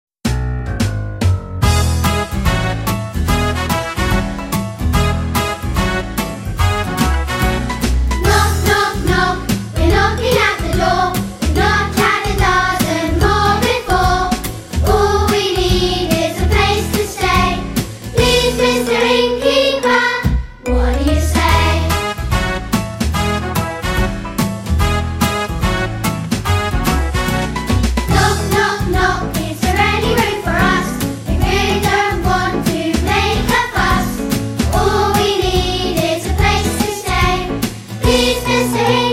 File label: Knock Knock Knock